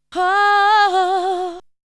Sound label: Vocal; ZERO G - Datafile DISC 1